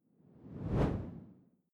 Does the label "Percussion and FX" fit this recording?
No